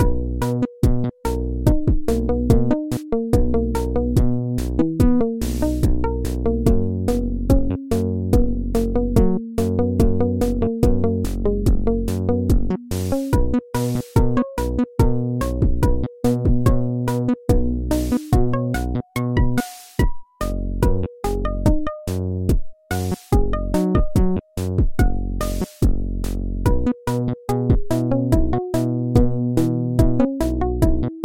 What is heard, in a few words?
honey bear loop